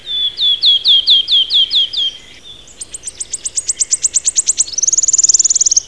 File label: Phylloscopus sibilatrix